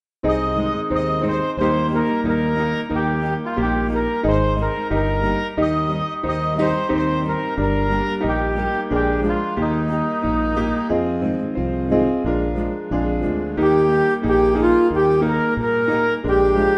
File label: There Is A Happy Land, Indian Air, Midi